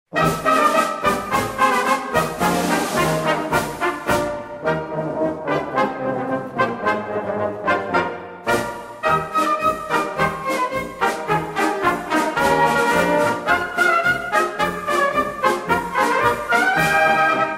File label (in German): Festmarsch